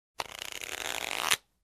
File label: Slot Machine